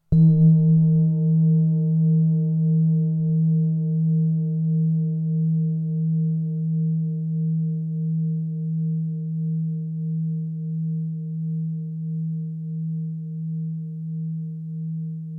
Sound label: 157.41hz; bol 18cm re